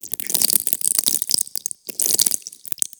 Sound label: Proto Infested Ancient Taunt Flesh; Warframe; Abilities